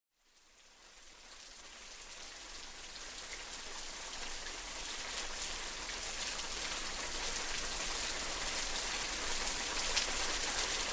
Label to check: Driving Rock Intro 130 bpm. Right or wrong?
Wrong